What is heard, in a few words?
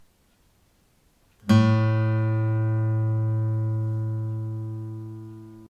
5th String A